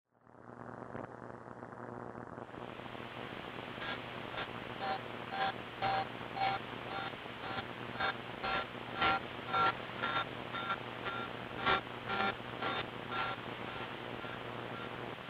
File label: tuning fork scene